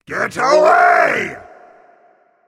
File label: Pirate stomp